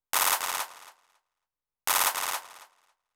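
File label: CLaP ROLL, Techno 138 BPM; Best Service ProSamples vol.54; AKAI; DAVE CEE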